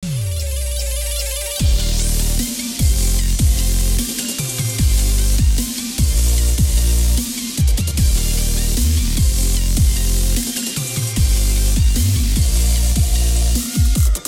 Mid Cut, Mid frequencies filtered on the DJ Controller; Modelling Filters on a DJ Controller